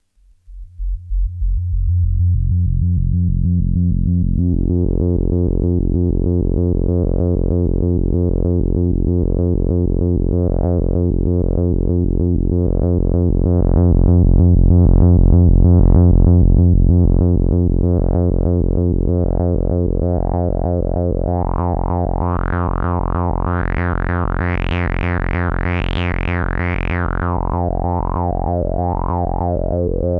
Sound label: LFO Modulation